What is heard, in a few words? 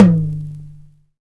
large room tom drum one shot d key, Royality free tom drum single hit tuned to the D note